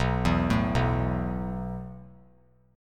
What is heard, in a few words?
B 7th Suspended 4th B down; B7sus4; arpeggiated; JGuitar